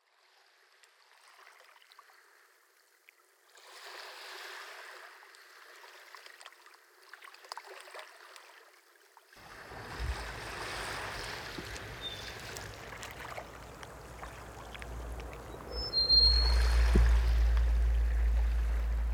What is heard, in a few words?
soundscape